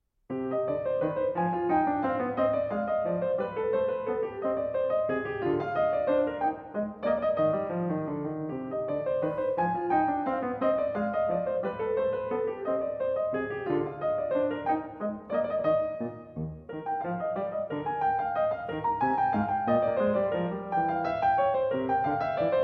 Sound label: French Suite in C minor; French Suite No. 2 in C minor, BWV 813; J.S. Bach